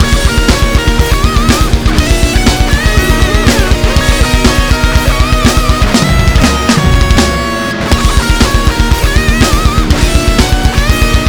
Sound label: Arcade Stage Complete; final fight streetwise 2006